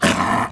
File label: Raptor Attack; Playable Tutorial Level; TR1